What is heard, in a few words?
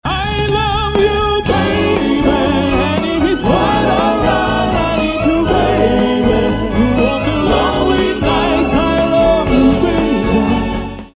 tenor; koncert dla ciebie mamo w csm